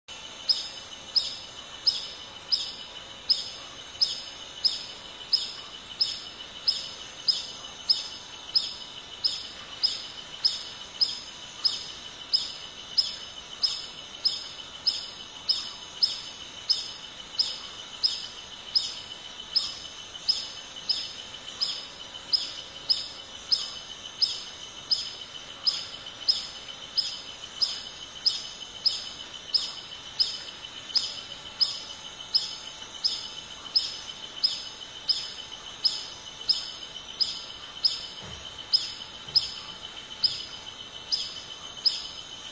canto do abelharuco preto

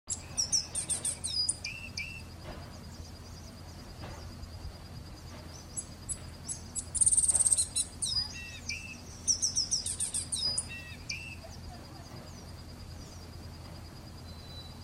Canto do papa capim preto e branco

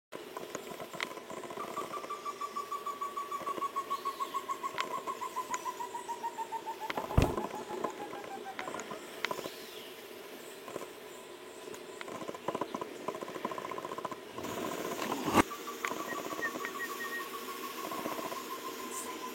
Canto do entufado baiano

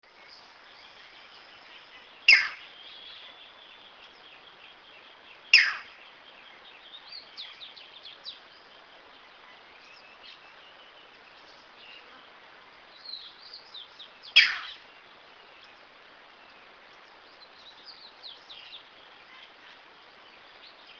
canto do socozinho

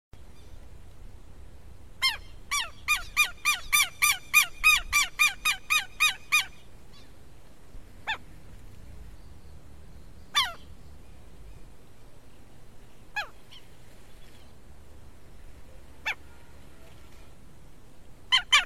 canto do abibe do sul

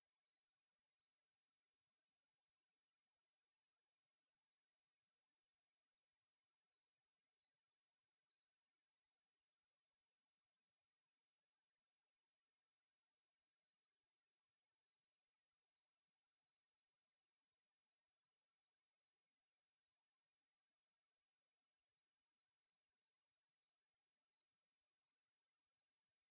canto do pica pau anao dourado

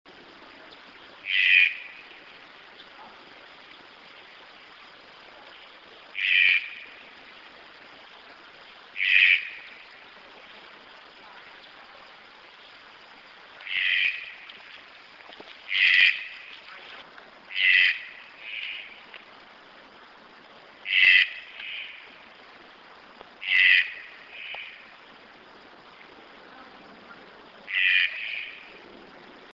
canto do tucano de bico verde

